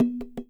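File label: TASHA RIFF, ethnic percussion; WorldSounds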